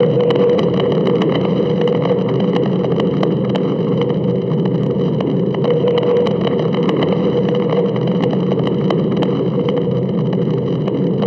Bonecrusher, Noises; Xfer Records Serum; Serum Presets

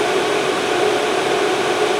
Inharm, Noises

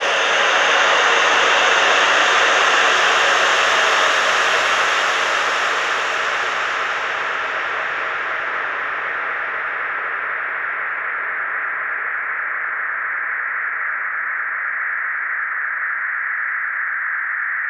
Atmos, Noises; Xfer Records Serum; Serum Presets

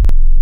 Dist Sub, Digital; Xfer Records Serum; Serum Presets

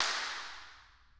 Claps; Xfer Records Serum; Serum Presets

